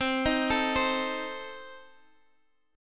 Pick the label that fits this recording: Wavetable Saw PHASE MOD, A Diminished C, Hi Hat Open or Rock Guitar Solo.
A Diminished C